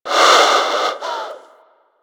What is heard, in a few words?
Bird Snore; Warframe; Hunting Critters; Sawgaw